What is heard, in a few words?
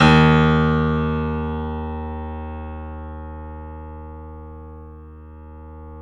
D#, The Piano; InVision Interactive